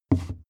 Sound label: step wood step stairs